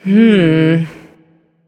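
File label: thinking, female; smite; voicepack; hera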